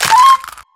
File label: Easter Egg Destroyed; fowl; Easter Blocks